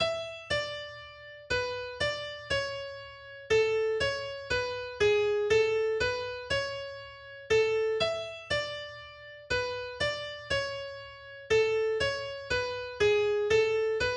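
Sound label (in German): Ein niederländisch Tänzlein Solo Akustikgitarre; Newsidler; AkGit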